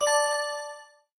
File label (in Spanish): ganar estatus